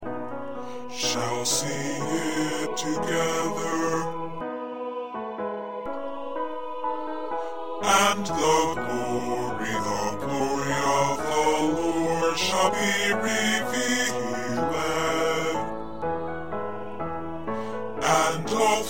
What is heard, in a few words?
Bass